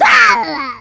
death; vortex; unit00

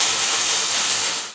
electro

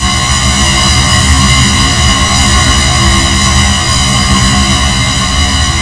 sonic fire; zaero; weapons

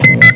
las arm; zaero; weapons; ired

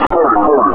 daed pain; rogue; daedalus

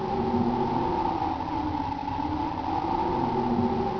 wind

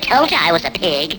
death; openffa; porky pig

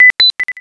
timer, c4

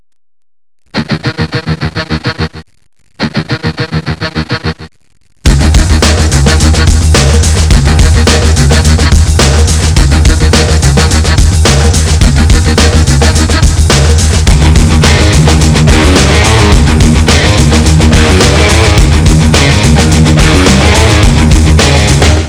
heavy; baseq2; anthrax

